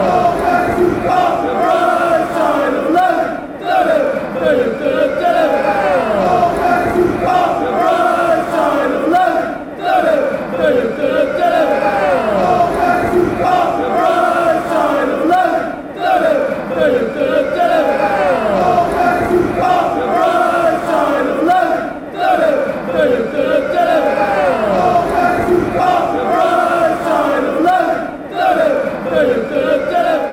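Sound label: football chants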